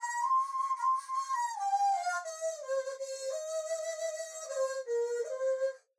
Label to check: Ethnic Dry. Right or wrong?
Right